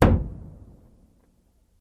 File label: Staff Hit Single, spears & staffs